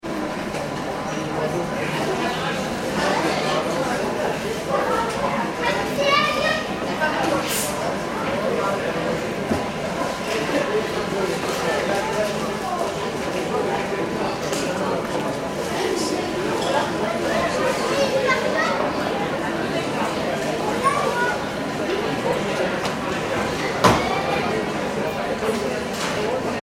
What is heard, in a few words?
ambience airport terminal, Small Terminal; Airport Ambience - Small Terminal Building With Check - In And Cafe Close - By - La Rochel; Airports; Backgrounds; la rochelle; McKinney Sound